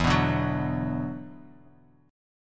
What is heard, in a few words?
E 7th Suspended 2nd, down; E7sus2; strummed